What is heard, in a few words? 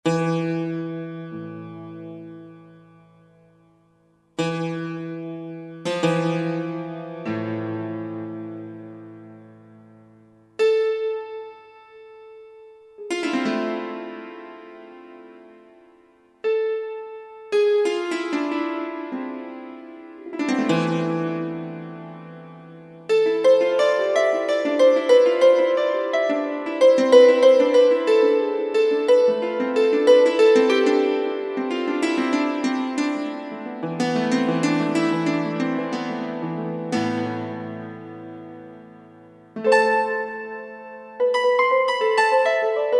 Cymbalon, Roland SR-JV80 Orchestral